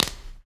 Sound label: Human Perc, Body Perc